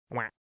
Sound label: quack; duck game